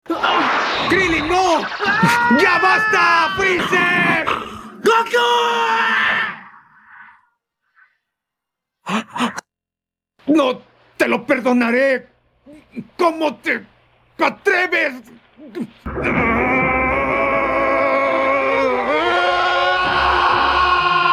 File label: vocals